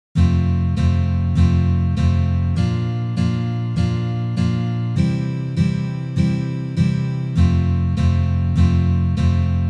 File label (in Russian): Uprazhneniya dlya igry akkorda Fa mazhor na gitare